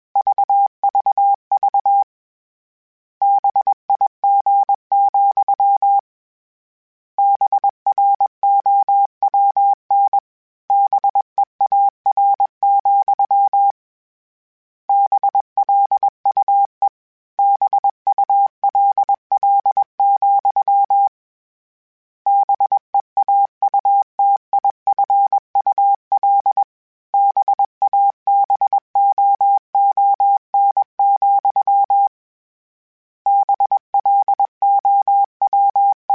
Morse Code, CW Code Groups; Mixed

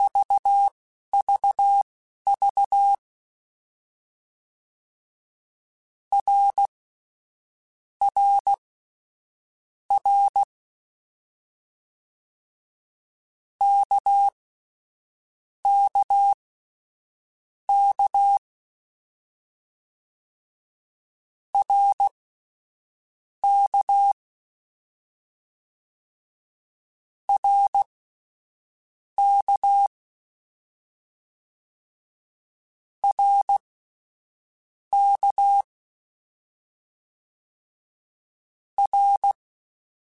CW Code Groups Mixed Short Runs Balanced; Morse Code; HamRadio

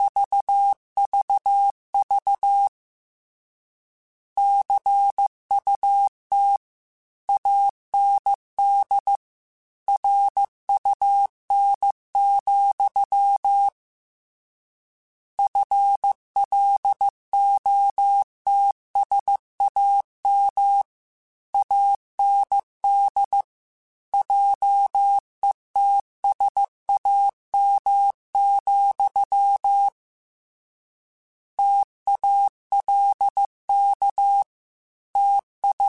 Word Pairs, Morse Code; HamRadio

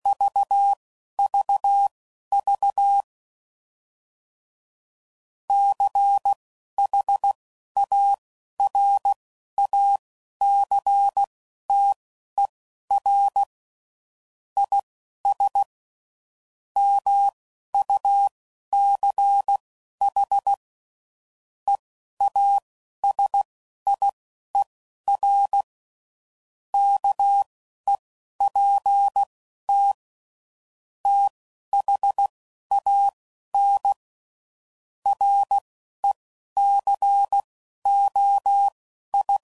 CW Code Groups 10WPM; HamRadio; Morse Code; Sayings

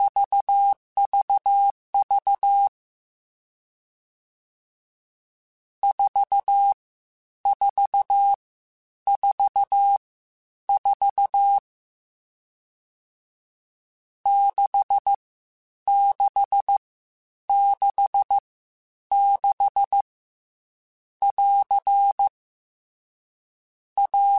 CW Code Groups Mixed Short Runs Staccato; Morse Code; HamRadio

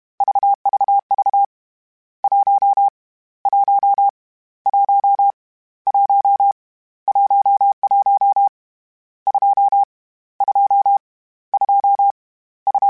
Morse Code, CW Code Groups; Mixed

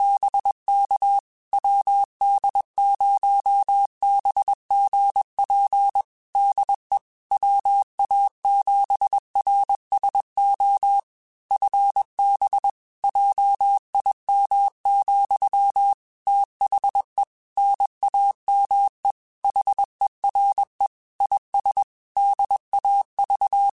Morse Code, QSOs

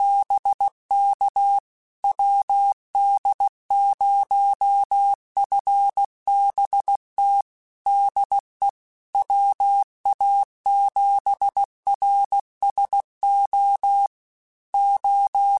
Morse Code, QSOs

